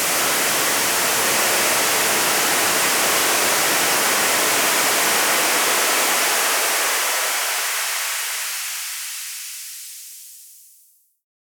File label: Sweep